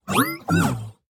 赞同的哔哔声; 守望先锋; 堡垒

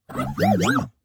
命令式的哔哔声; 堡垒（守望先锋）; 普通语音

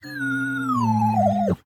痛苦的哔哔声; 堡垒（守望先锋）

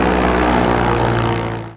运输机轰鸣; 红色警戒2; 超级武器音效; 伞兵

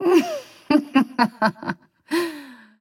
laughs

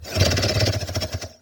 僵尸呻吟; 堡垒（守望先锋）

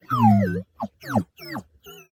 伤心且忧虑的哔哔声; 堡垒（守望先锋）

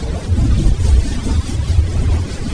就绪后的轰鸣; 红色警戒2; 超级武器音效; 天气控制仪

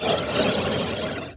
僵尸呻吟; 堡垒（守望先锋）

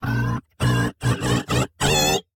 命令式的哔哔声; 堡垒（守望先锋）

